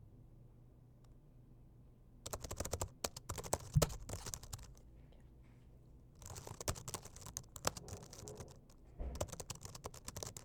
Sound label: Typing